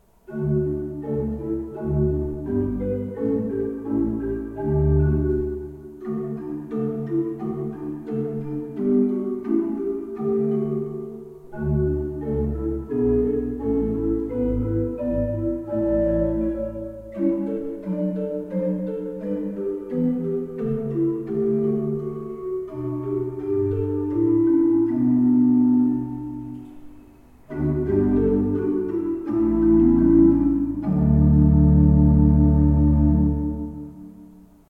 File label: pipe organ; Schuke Potsdam 25 II P